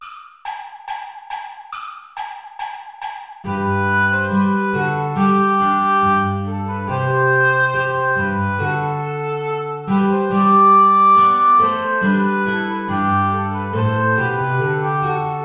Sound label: ballet play along without guitar